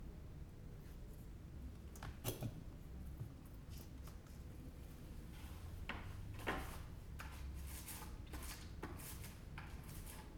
Painting

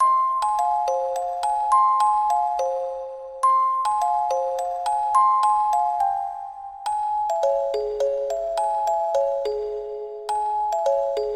Music Box Loop

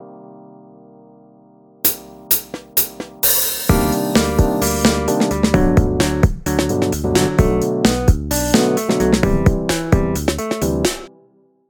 Intro Outro Music

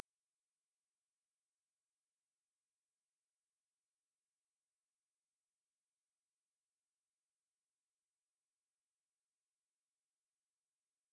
Ear ring noise

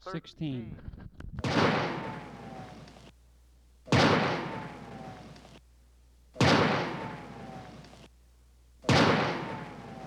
Old Time Rifle

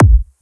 Psy Kick Drum; Romantic Elf

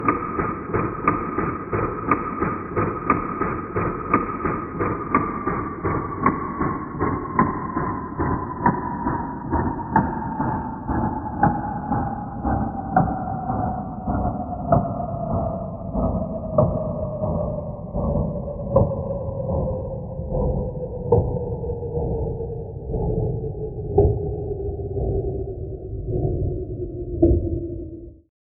Cinematic Ticking Clock Heavy Gears Slowing Down

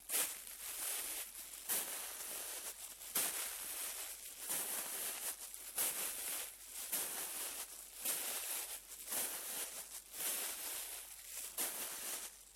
Walking On Plastic Grass; Planet

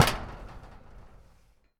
Dry Impact Hit Metal Plate

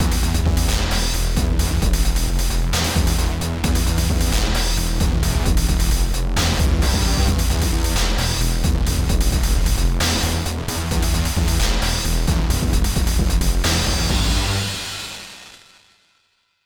Boss Stage

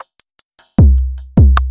Rhythm maker Randomized 140 Bpm Loop

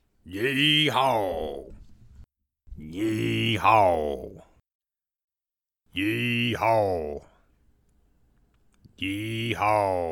Yeehaw

